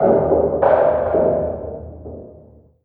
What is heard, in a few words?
Random Single Hammer